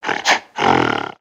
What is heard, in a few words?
atk idle, creatures organic